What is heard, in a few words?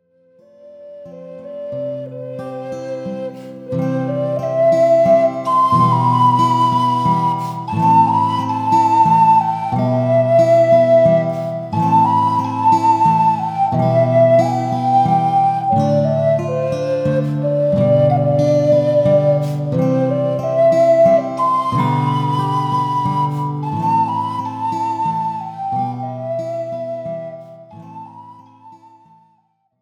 indian flute